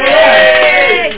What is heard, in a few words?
bonus; Nothing but Tetris